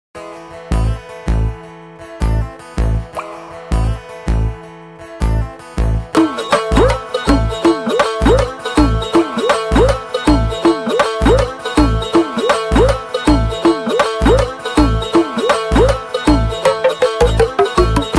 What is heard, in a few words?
Instrumental Music Ringtone